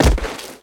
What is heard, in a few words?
Impact Crushing Infantry